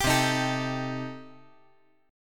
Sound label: C Minor 6th C